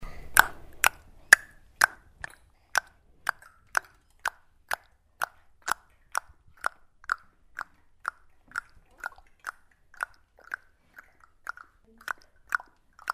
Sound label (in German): kind schnalzt zunge